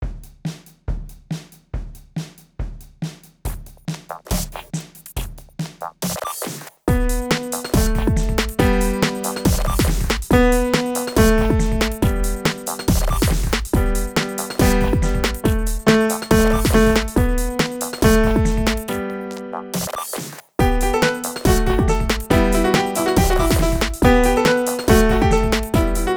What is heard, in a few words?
Hot Cross Buns 140bpm, Recorder; Recorder Club